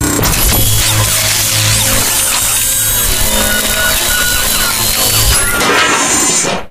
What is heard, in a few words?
laser cutout; source engine; portal2; animation sfx